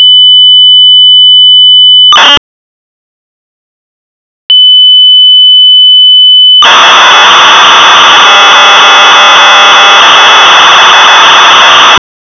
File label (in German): DE-HYMNE